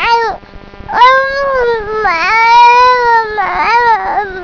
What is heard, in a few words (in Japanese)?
guzuri; kazoku; midi